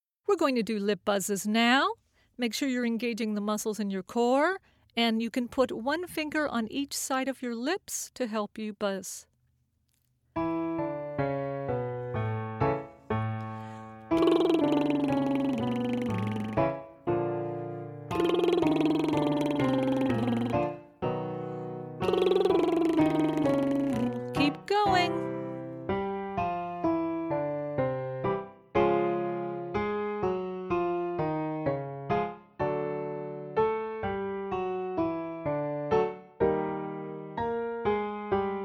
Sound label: Lip buzzes